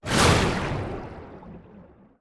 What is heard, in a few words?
ui player spell water throw